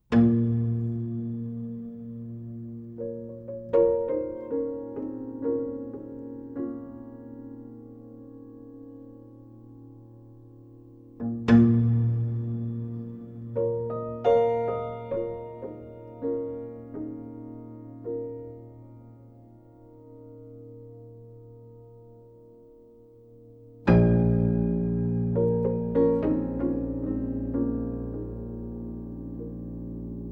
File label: Solo Piano